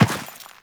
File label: Step Dirt; Footsteps; Warframe; Teshin